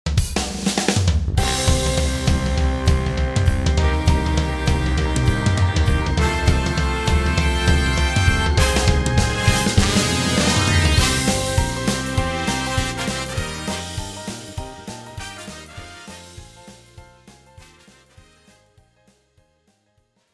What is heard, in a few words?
Jingle fade out